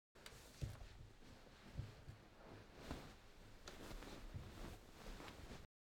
Getting Into Bed

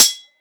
Sword Clash

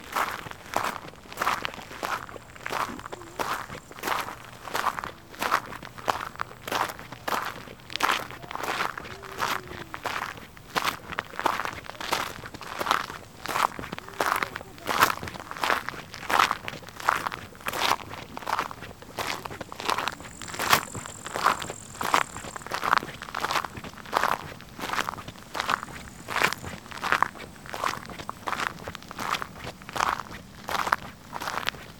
Gravel Path Walk

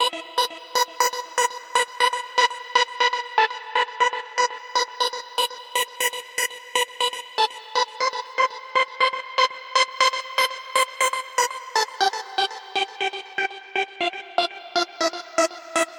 Key A 120bpm Ebm Synth

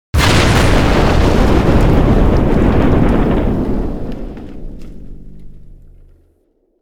Huge Explosion

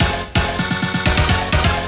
slap

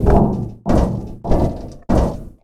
Vent Footstep

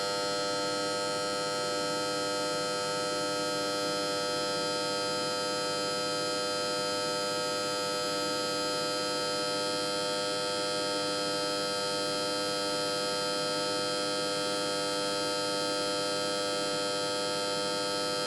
Electric Power Transformer

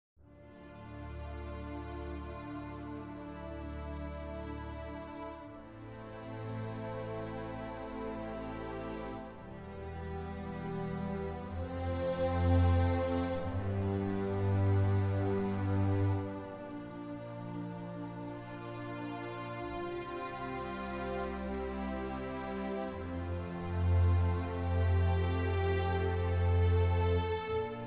Film Score Soundscape Belong

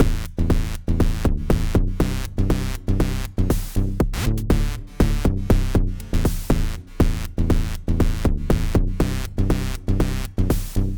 Groove

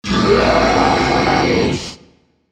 Monster Roar

